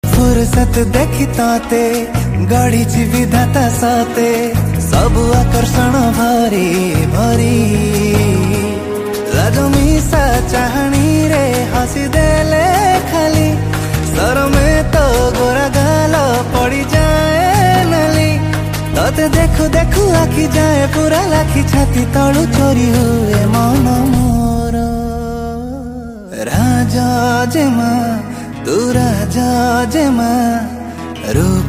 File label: Odia Romantic Song Ringtone